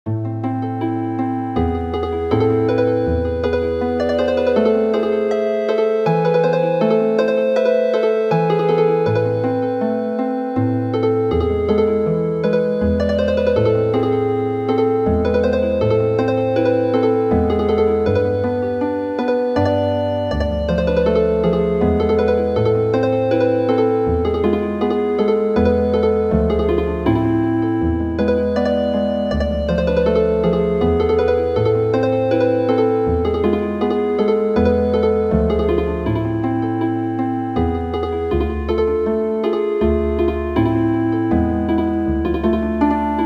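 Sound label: Healing Harp, midi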